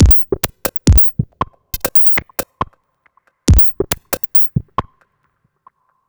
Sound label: Electronic Percussion